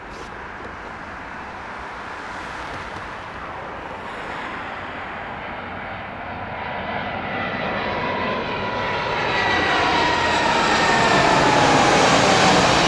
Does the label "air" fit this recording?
Yes